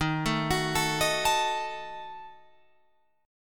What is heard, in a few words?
Eb Diminished, Guitar, Open F; Ebdim arpeggiated